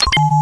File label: button; svencoop